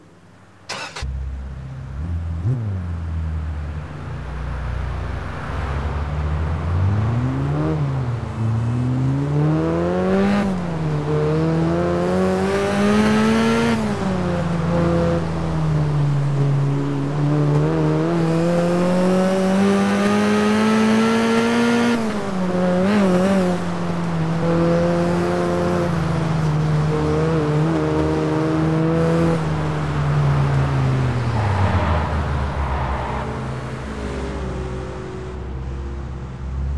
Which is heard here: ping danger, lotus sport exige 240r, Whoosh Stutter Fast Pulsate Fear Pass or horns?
lotus sport exige 240r